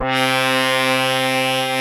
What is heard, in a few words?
THIN LEAD C3; USB Soundscan vol.49; Analog Fantasies; AKAI; PROPH